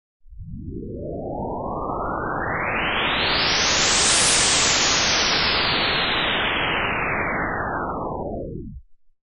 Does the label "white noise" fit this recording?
Yes